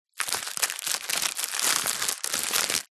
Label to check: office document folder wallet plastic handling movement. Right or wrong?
Right